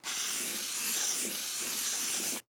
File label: Marker Long